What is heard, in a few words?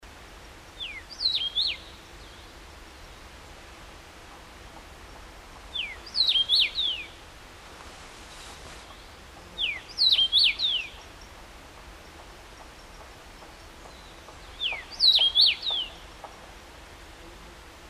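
Carpodacus erythrinus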